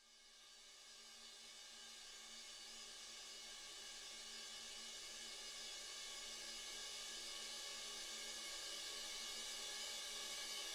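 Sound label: 140BPM Ultra Long Reverse Cymbal; FX Fills; 32Bars; Vengeance Samples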